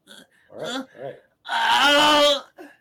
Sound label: moan